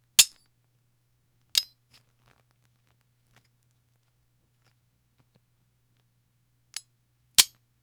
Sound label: taking a musket out of, holster